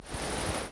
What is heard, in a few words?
rune Magic; hexhollow